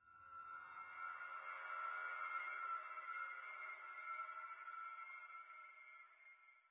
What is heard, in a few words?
Howling; Lethal Company